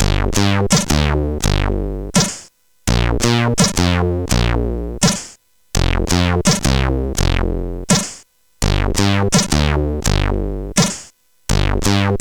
Bass, CSG8580R5; sid

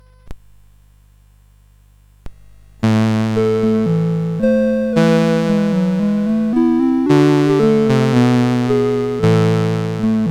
Pavane, CSG8580R5

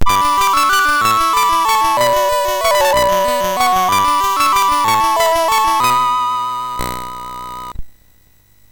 sid MOS6581R3; Firelord; hvsc

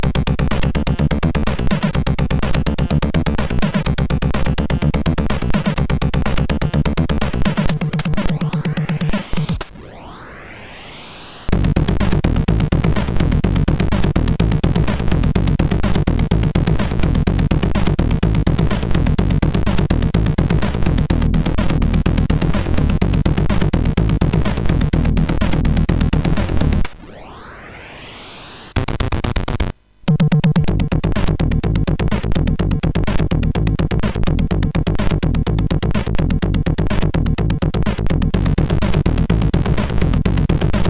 Techno, MOS6581R4; hvsc

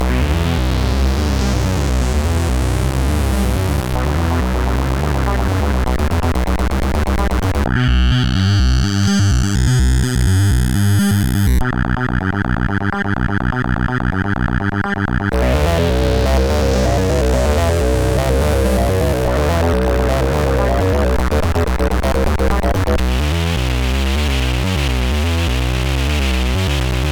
Techno, sid CSG8580R5

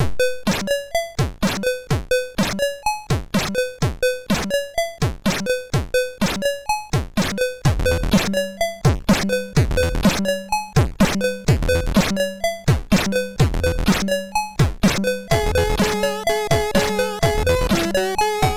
Jingle Rock, MOS6581R2

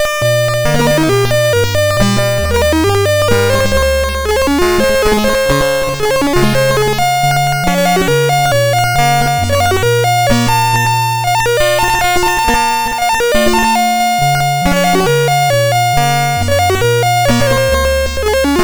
Randomly generated music BASIC, sid MOS6581R2

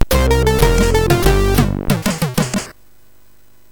sid MOS6581R4

